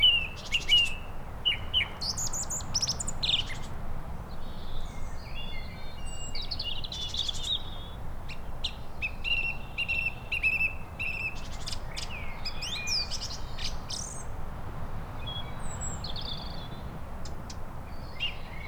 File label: Turdus philomelos; Saint-Pierre-Quiberon